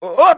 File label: taunt flip exert